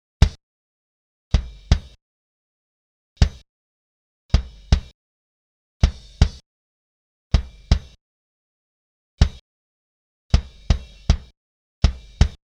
Kick No Plugin

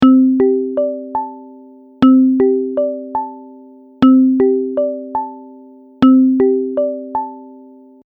Note Echo

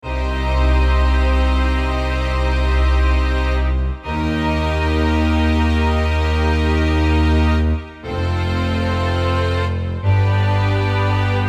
Strings Horns; Logic

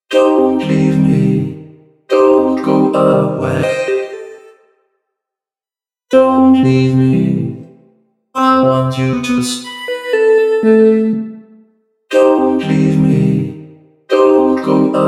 pitch quantization

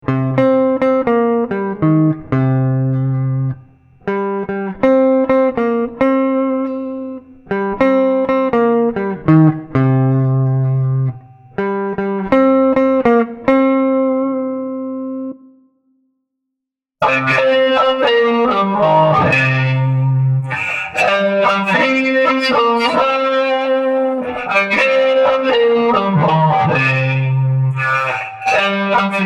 Vocal Synth 2 Talkbox; Elektronisch verfremdete Vocals

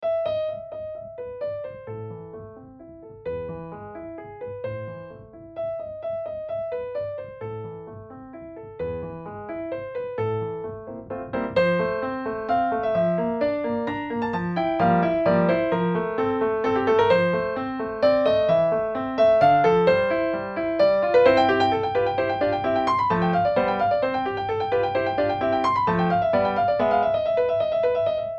Bechstein Elise

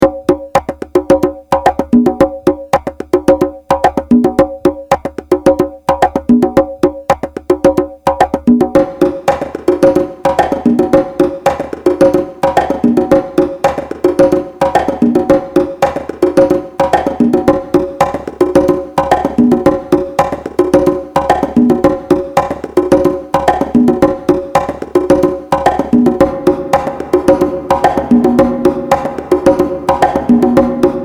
all congas

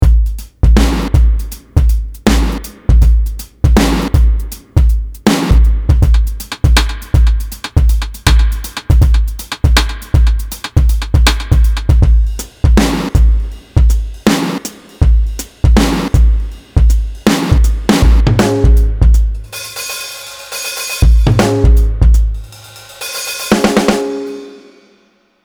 dirty hybrid

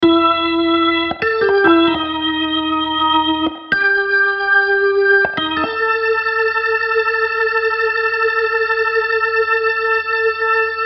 rooms, solo, B3 Leslie

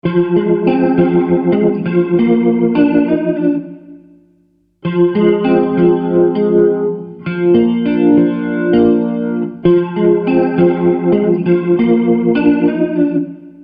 Leslie Gtr

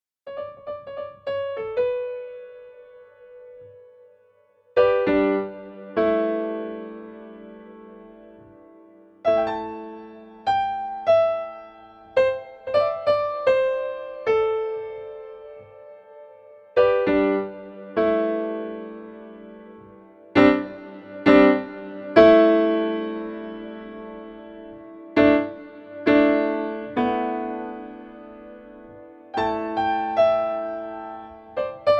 Bechstein Distant Melody

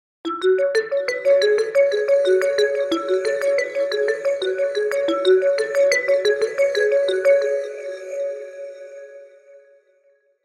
Vib Phraser; Exorcist; Vibrafon

